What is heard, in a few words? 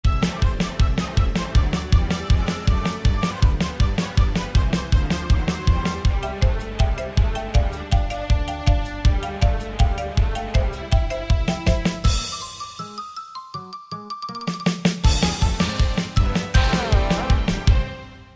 Cartoons Tune Rmx